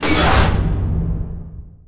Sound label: laser; Earth and Beyond; EA Games